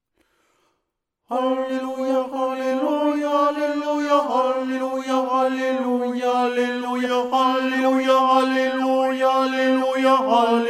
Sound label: ALLELUIA Tenor